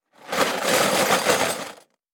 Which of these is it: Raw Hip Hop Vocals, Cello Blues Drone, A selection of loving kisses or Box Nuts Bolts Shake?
Box Nuts Bolts Shake